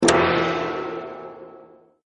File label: start Game; Legends of the Hidden Temple - Unlock the Past